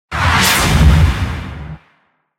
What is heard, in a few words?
Whoosh